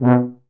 B2 TUBA

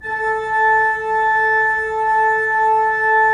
Pipe Mix Celeste, 3D Organs; E-MU Producer Series Vol. 5 – 3-D Audio Collection

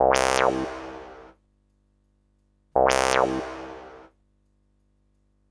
Upbeat Bass, Drum & Bass; Fluid Dynamics

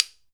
PAN CLAP, KOREAN DRUMS; AKAI S6000 CD-ROM; WorldSounds

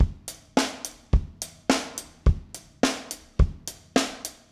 Garage Funk Drums, 70s Analog Funk; Sonic Foundry (Sony Creative Software); What it Is!

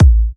Kicks A; markus hakala

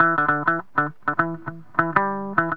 GTR; Zero-G; Funky Elements

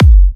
F# kicks; markus hakala kicks

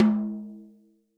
tom, Real Drum Kit, funk kit; Best Service ProSamples vol.43 - Real Drum Kits

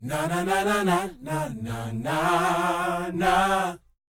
NA-NA F; Spectrasonics Vocal Planet

